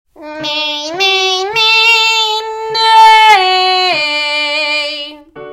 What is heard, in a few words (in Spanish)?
Twang Nasal y Oral Nei nei nei neee